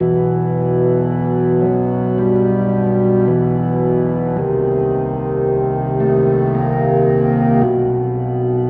Creepy Organ Loop; Super Crazy Rhythm Castle; PS4; Xbox Series X|S

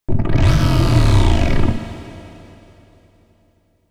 wub rush; Bust n Rush

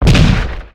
Humanoid; Zenonzard

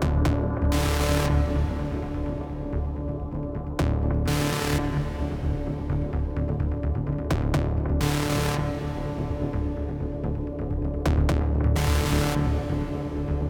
Hostage Music Pulse High Tempo Extra Drone; Battlefield 3

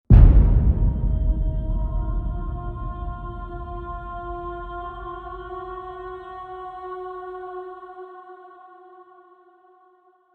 main menu start, soundtrack; Tyranny Gold Edition

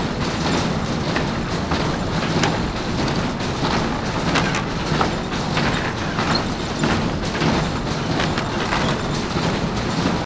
large spinning machine looping; a dump in the dark

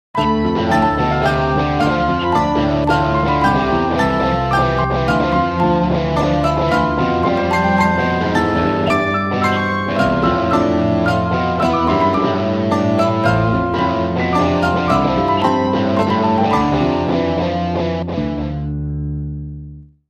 concert bg; DramaIria2

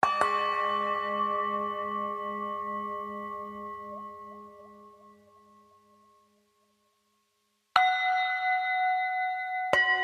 Village Bell; The Unfinished Swan

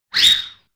Player throw enemies; dondokodon

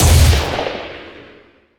Sci-Fi Weapons-Sniper Rifle Single Shot

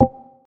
kids car switch item back

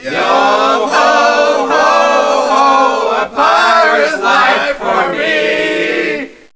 PIRATE SONG

